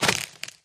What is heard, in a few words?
spin start; In The Forest; Wazdan